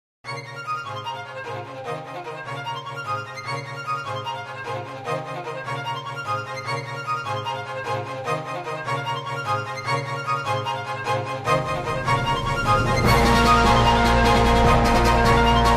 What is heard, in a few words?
orchestra arrange; thunderforce5; akindou